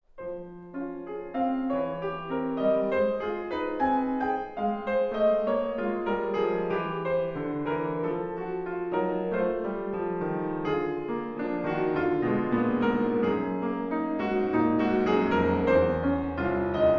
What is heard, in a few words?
Piano; Encontros com a Musica de Sao Paulo; Cantilena no2